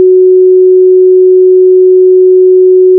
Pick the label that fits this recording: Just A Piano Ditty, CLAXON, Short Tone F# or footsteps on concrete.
Short Tone F#